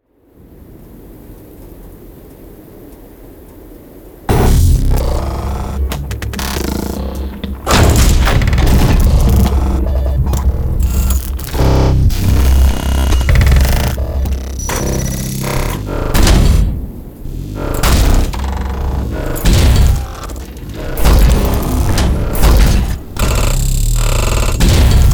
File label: Assault Mech Robotic War Unit Sound Effects; Bluezone